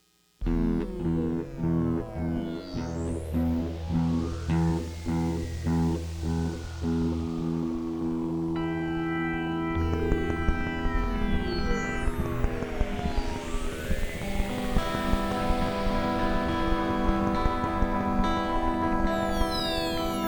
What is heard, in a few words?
Feedback Attack intro